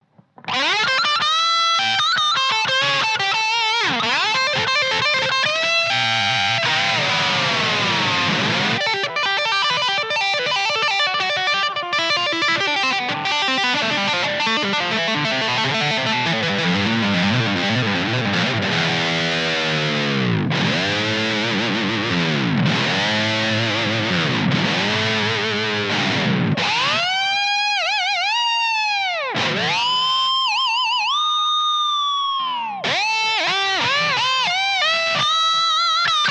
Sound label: playing, greco